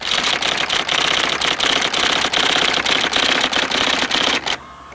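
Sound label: typewriter, office clerical; WAV Sound Effects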